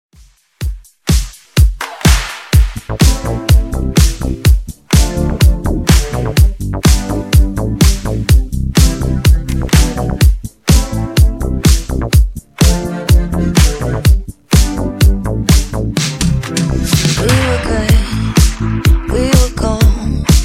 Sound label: Disko Funk Remix 125Bpm